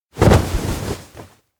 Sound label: futon jumpup two; rikka; anemoi パッケージ版 1.1